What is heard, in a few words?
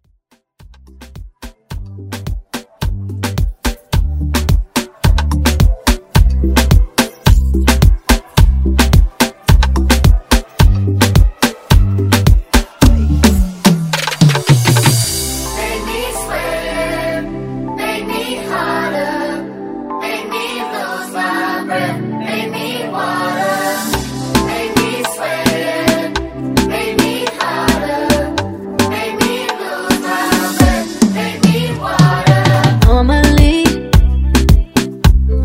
Moombah Remix